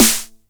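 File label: 909 SD Tape, snare drum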